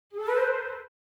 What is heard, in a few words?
Cartoon flute gliss